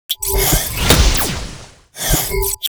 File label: Arena Stone Skill; Gamigo